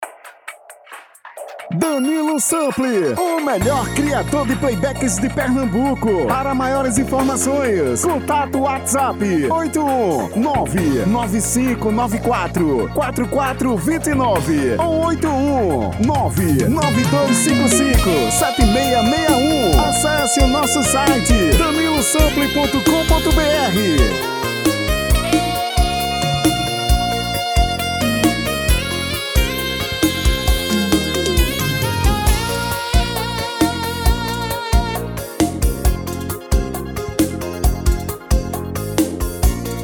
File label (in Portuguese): vers brega atual